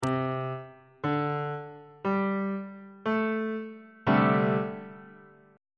c double sharp dominant 7th suspended 4th 3rd inversion on bass clef